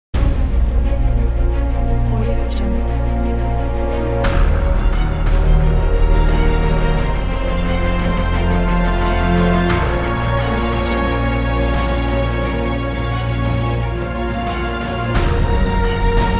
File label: Epic Cinematic Trailer Music